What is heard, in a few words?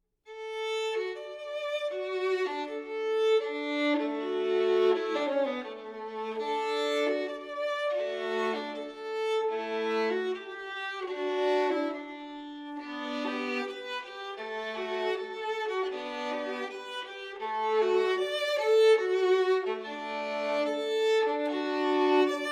Solo Violin